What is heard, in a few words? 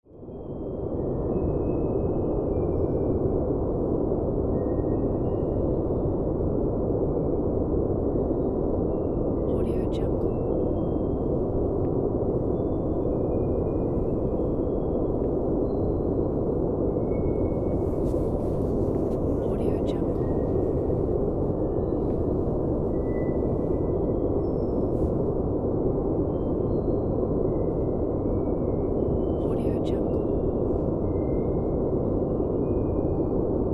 deep dark atmospheric